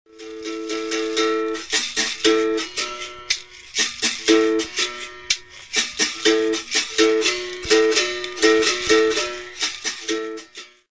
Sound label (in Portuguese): Toque de Angola, capoeira